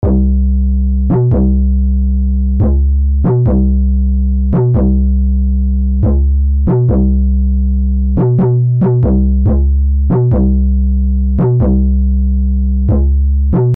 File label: Serum 808